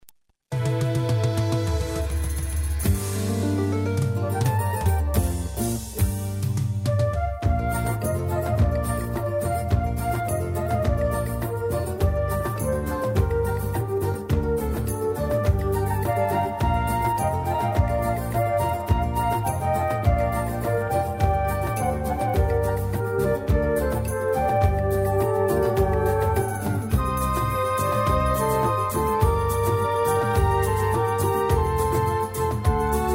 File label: debut instrumental